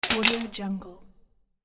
knife drop; soundfxpro

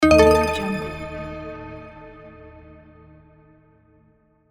spooky game reveal

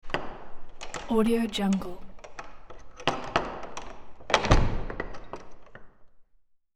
key unlocks dungeon door with reverb; hollywoodedge

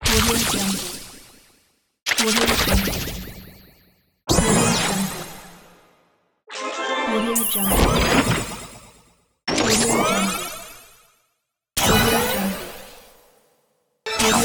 puzzle game power up

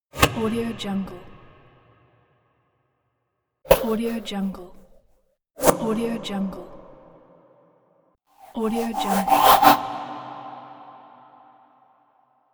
wooshes intro; intro-boom

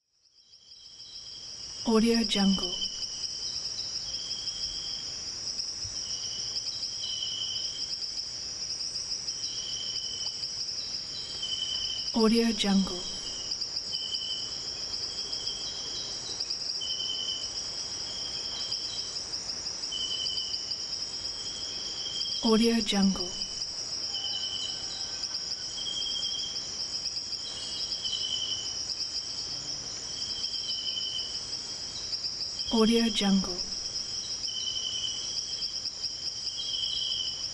night calm ambience; sunnyplanet

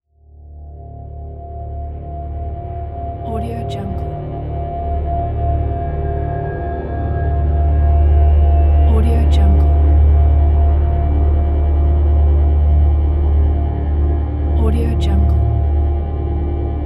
drone

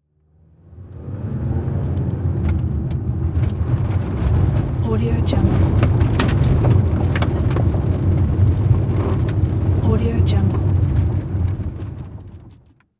car chase tire squeal and peel accent

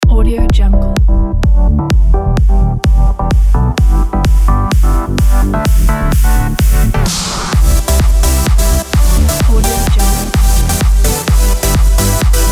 edm nation loop; soundgridmedia

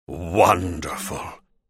wonderful mage

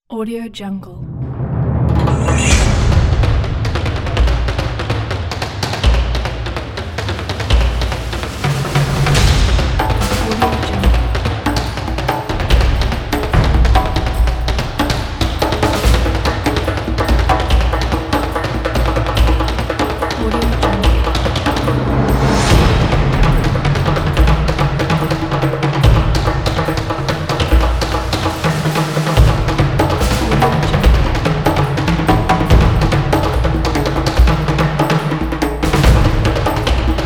fast and dramatic drums

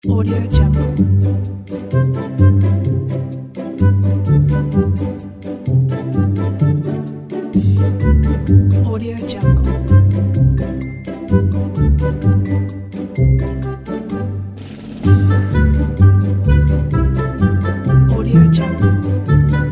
magical school vacation

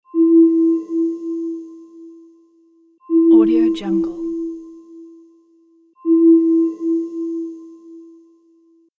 atmospheric horror ringtone

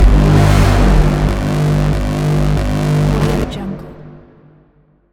music growls

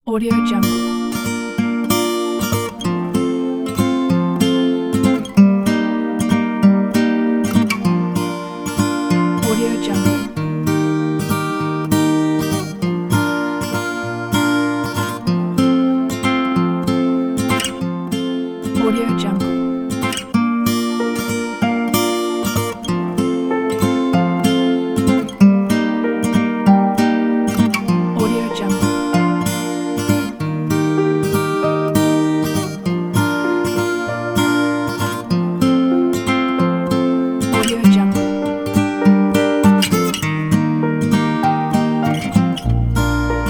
sentimental acoustic folk

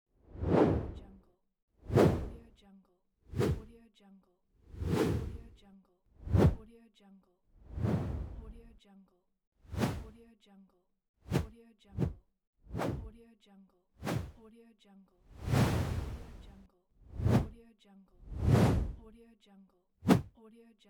wooshes; dedge studio

